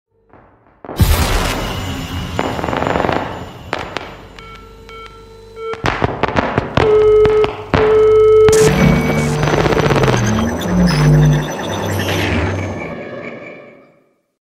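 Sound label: glitches; kiberpank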